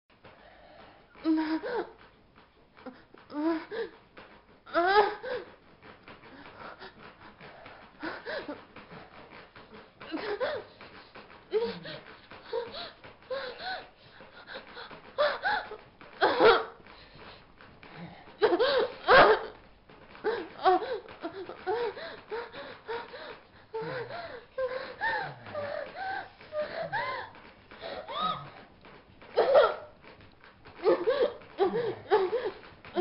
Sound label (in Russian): звук во время жесткого секса и скрип кровати